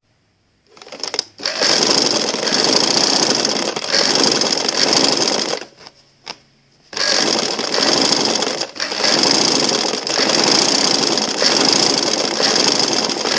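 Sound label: zvuk shveynoy mashinki, sewing machine sound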